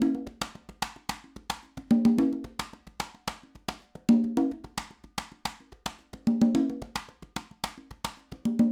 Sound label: Acoustic Percussion